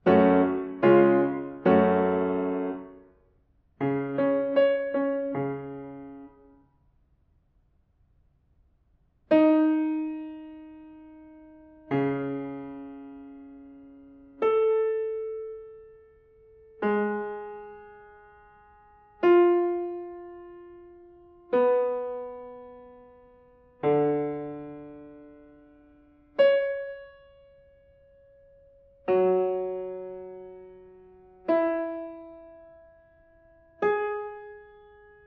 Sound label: diatonic collections